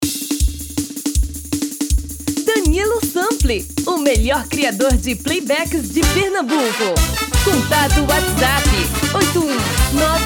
FREVO

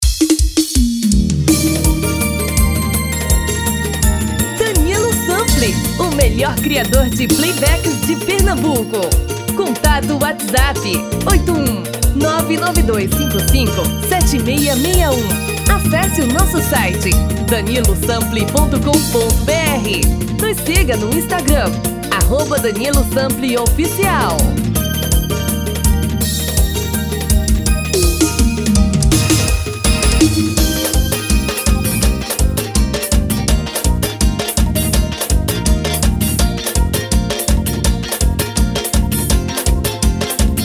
ELETRICO tom feminino

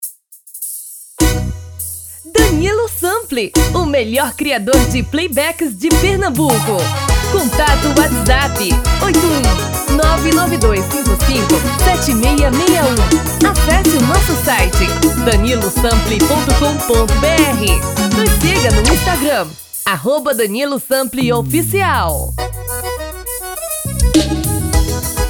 feminino

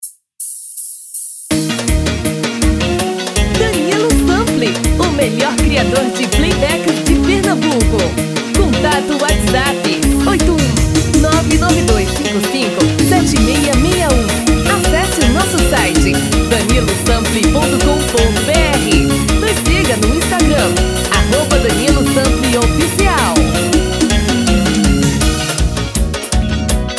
Eletrico

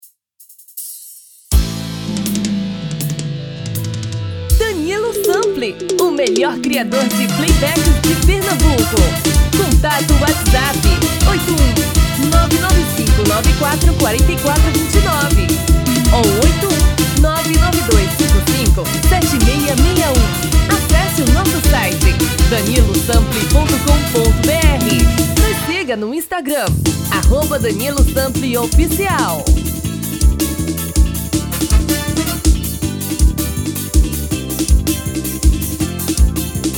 Frevo

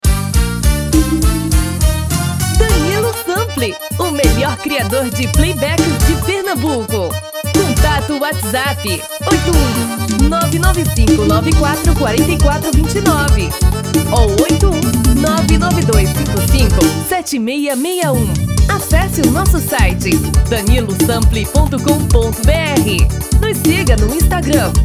Forrozao

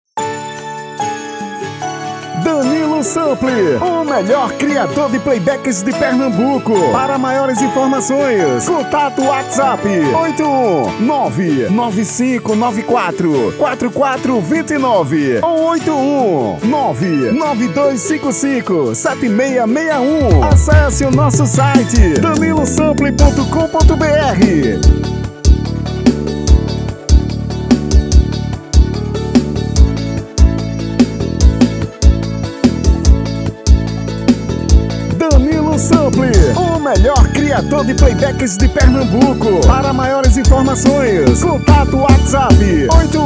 Versao Brega

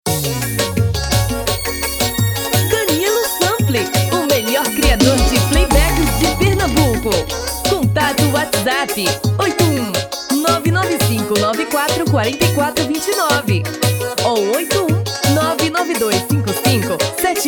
Piseiro

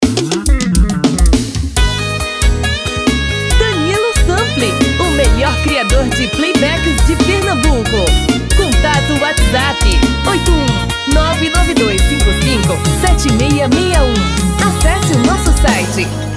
tom masculino

